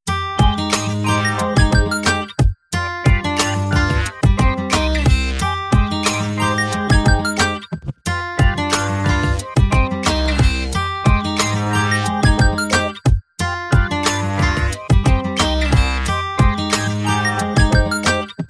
klingelton chill ruhiger klingelton

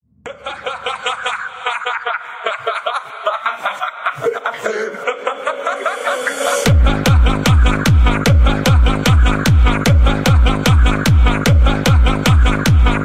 hahaha hardstyle klingelton

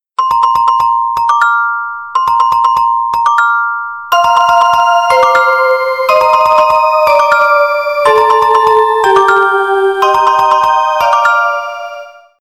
palm treo klingelton; ein gutes jahr; iphone

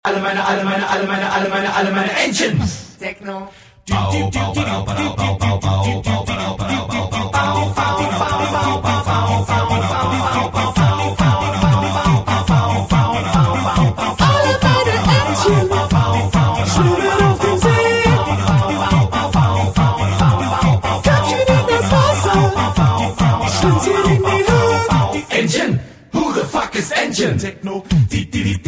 enten techno

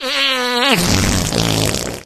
klingelton furz pups geraeusche and sounds, furzen geraeusche

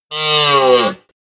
Elch Brunftschrei Hirsch Ruf

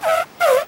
elefant sound; sendung mit der maus; elefant troeten

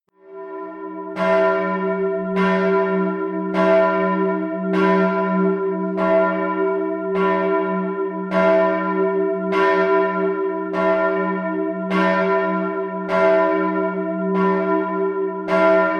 hochzeitsglocken

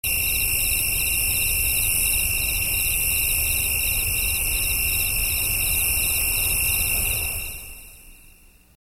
grillen

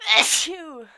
niesen von frau; niesen klingeltoene; erkaeltung husten

